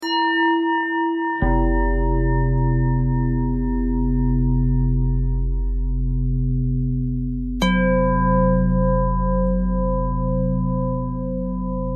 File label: bell thread pentatonic